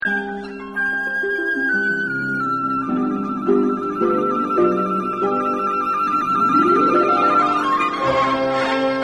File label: trill flute